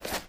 STEPS Dirt, Run

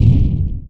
EXPLOSION Short Smooth Deep stereo

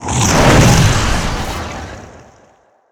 Magic Spell Phoenix

